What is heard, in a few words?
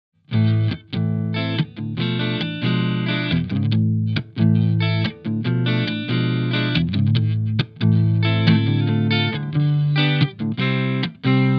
clean; ampvergleich